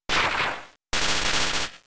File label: Zap Cannon; Pokemon; Gen 3; attack moves; RSE